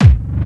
hardcore 1, bassdrum; Ueberschall; Techno Trance Essentials; DRUMS

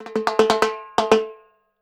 Percussion Loops, Brazilian; Houseworx

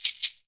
SHAKER; SHK+RATTL; Spectrasonics; Heart of Africa

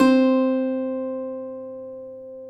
CUATRO; Spectrasonics; Hans Zimmer Guitars VOL-2

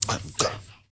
MALE RAV, MALE NOISE, Heart of Africa

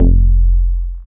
BAS FM DX-7

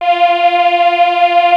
C 605 CHOR, Yamaha C605; TECHNO-BWARE

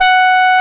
G5, ANA HRN

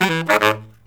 Killer Brass Riffs

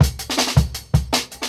Jungle Breaks; Original Jungle Breaks

